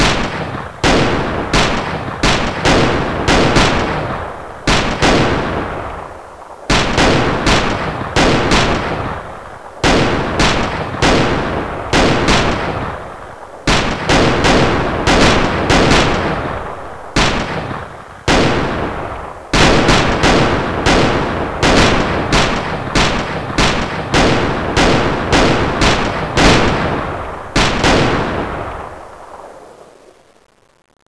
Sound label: shooting; aomdc